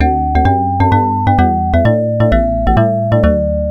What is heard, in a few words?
Battle ~ Draw; pepen ga pengo genesis